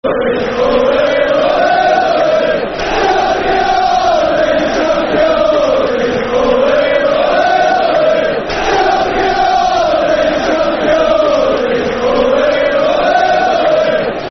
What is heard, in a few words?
Championes, Football Chant (Soccer Song); Man United